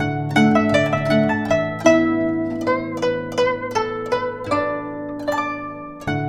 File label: GUCHIN 80 D; INIS - Opium